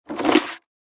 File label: Office Fax Machine Remove Paper From Feeder Lexmark X4270